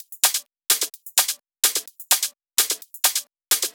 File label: Drumloops 128BPM